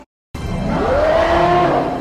predator roar; city hunter

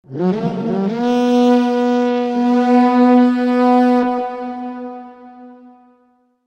horn; isengard

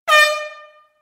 short air horn blast, stadium arena

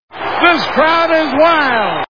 crowd is wild

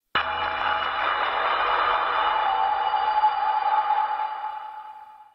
screech dark room

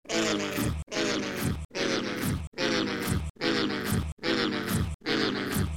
chords descending

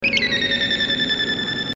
warning alarm; gundam

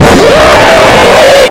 jumpscare; project midway

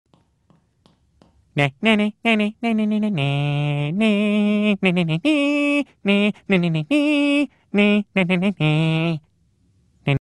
singing; family guy; star wars

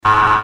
buzzer; Mock the Week

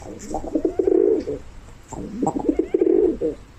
pigeon sound

